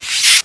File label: snake die; koala seasons; raylib